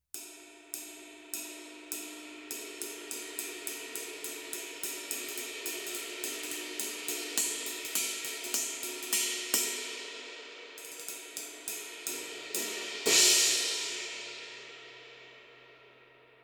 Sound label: Symmetry Ride